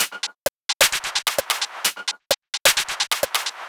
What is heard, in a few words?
130BPM Full No Kick, techno; drum fx; Beats